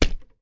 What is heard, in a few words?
collision; Angry Birds Space